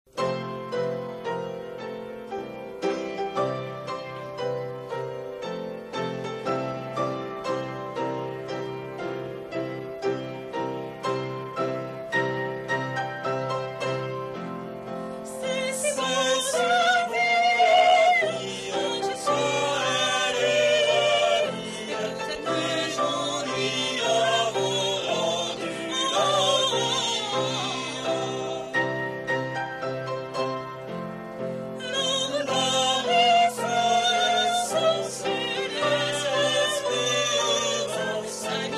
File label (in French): avec piano